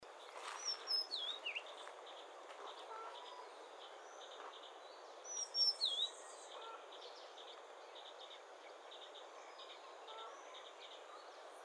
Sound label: madagascar magpie robin